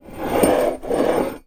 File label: household mug slide wooden bedside table; zapsplat